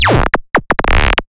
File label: Pulse; 300 Drum Machines; DevSnd